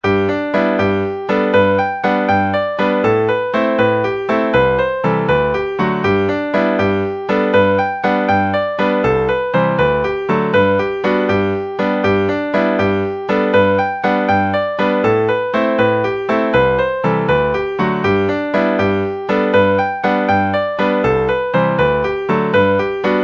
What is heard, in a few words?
cowboy jig